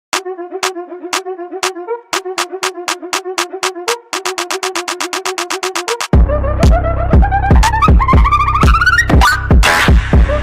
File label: dark evil beat